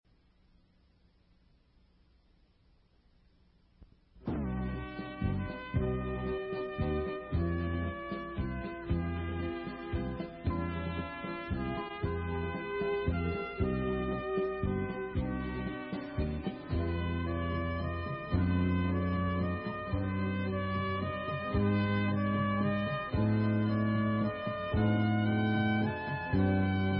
Instrumental

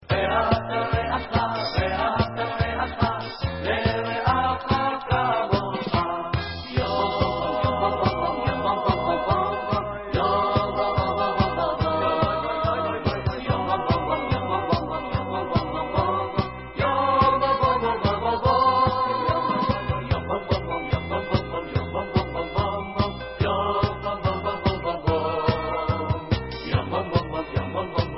Cantor

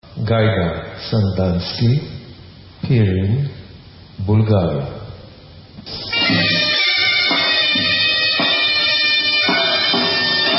Gajda Bulgarian Instrumental; Sandanski

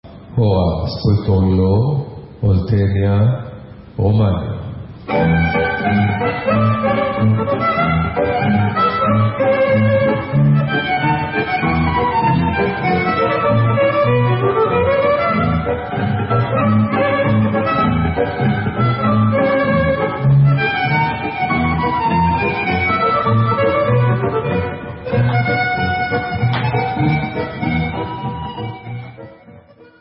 Rumanian Instrumental

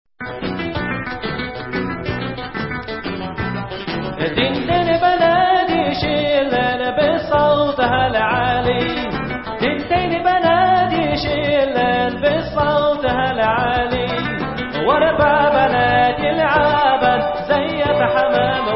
VOCAL

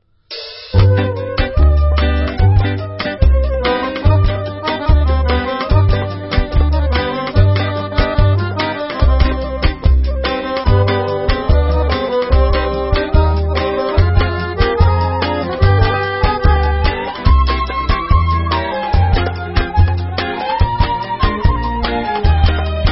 Debka Instrumental; Debka Baba

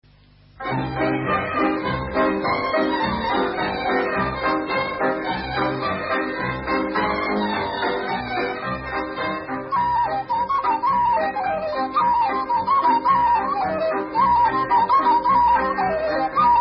Rumanian Instrumental; Mureletul

